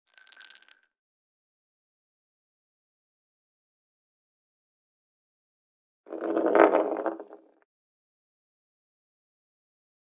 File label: Metal & Plastic Shell Rolls On Concrete & Wood; Hollywood Edge; Heavily Armed